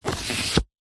board game game card remove slide out from pile stack; telstrations